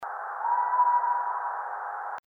voice, bigfoot

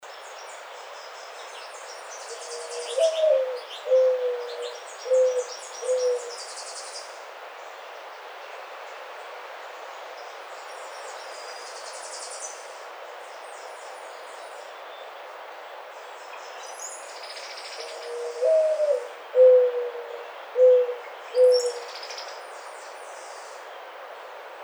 Mourning Dove, birds

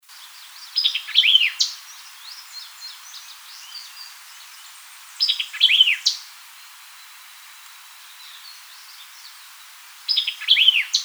White-eyed Vireo

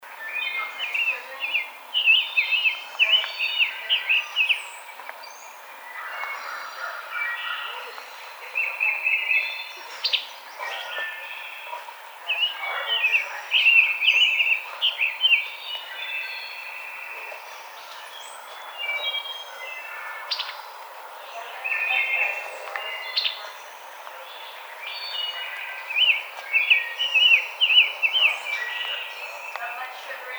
Rose-breasted Grosbeak

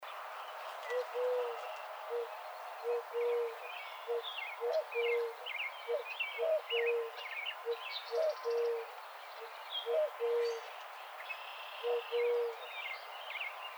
Eurasian Collared Dove

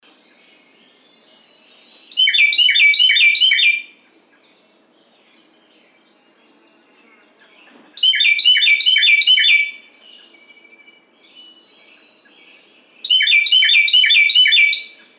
Carolina Wren

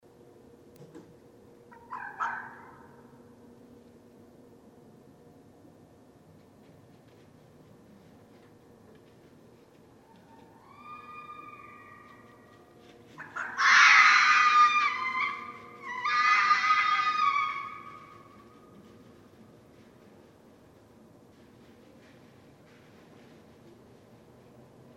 Red Fox

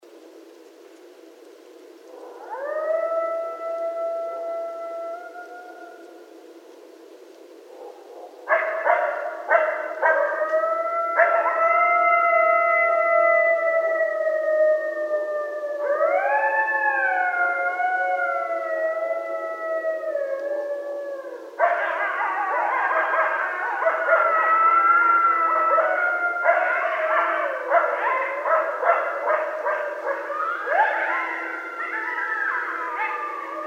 Coyotes; Coyotes (Canis latrans)

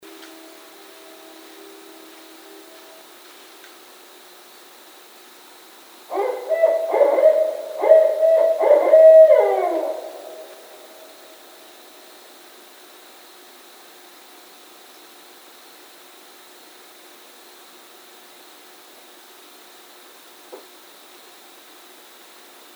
Barred Owl

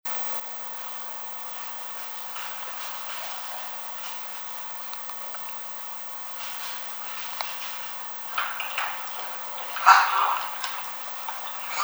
Chatter, bigfoot

